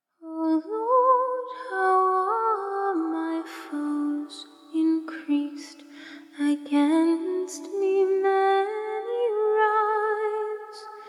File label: hymns of psalm acapella hymns